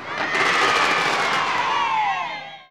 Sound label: confetti; spiritheroes